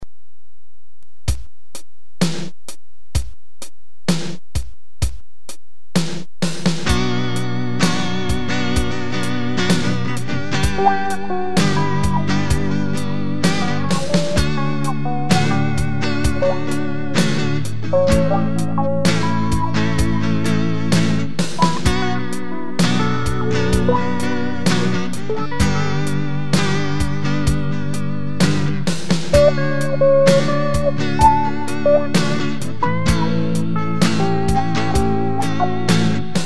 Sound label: rythm